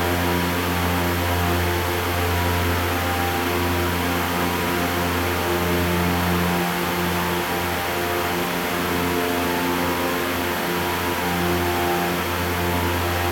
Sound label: ambience industrial